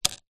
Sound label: transport drone propeller down on wooden surface dji phantom 3